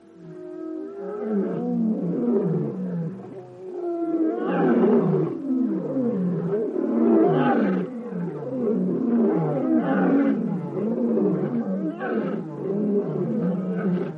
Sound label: african lions